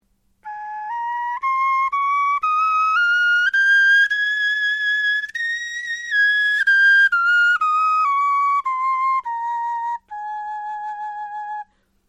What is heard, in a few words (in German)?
tonleiter, knochenfloeten